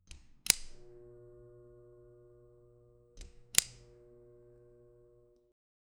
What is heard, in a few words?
switch on echo effect ele, electricity